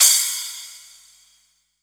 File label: CYMB, Drum & Bass, 135 BPM; Ueberschall; Drum & Bass Resonance